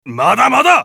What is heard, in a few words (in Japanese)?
ダウン; カート